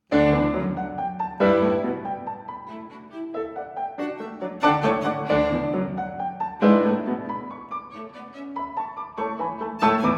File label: trio scherzo; l v beethoven